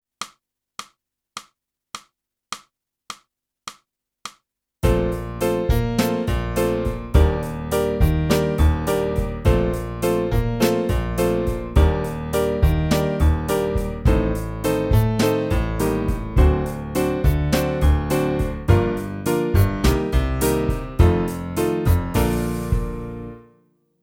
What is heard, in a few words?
clarinet; boosey method